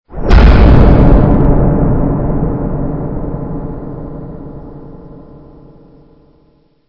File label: Stinger; STINGERS